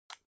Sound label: OS Sound Speaker Mouse